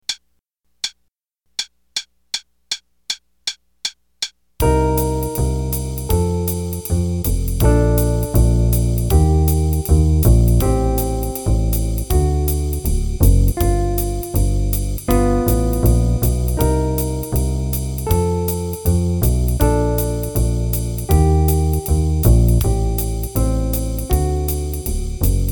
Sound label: Play-a-long, qn=160; 4 choruses; Jazz Improv Play-a-Long